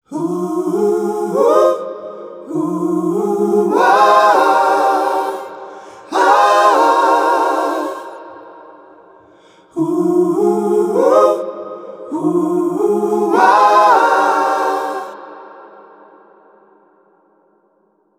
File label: Master Room MR-IV Choir